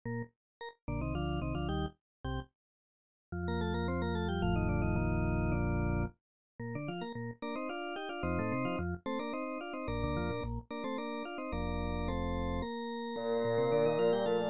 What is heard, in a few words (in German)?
clavier und pedal; oley johann christoph; herr gott dich leben alle wir; in canone all ottava